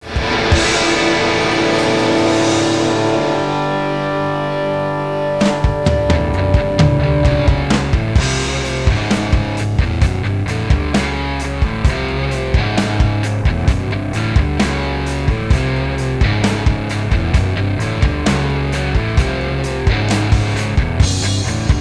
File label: karaoke